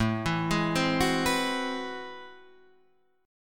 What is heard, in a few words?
A Minor Major 13th, down, Guitar, Open D